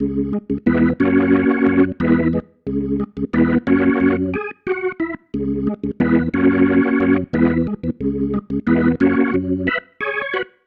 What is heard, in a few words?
Pattern, Organ